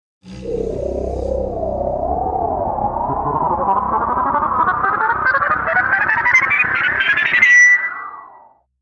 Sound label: Scifi Audio Logo Ident Spinning Increasing Transmission; Lynne Music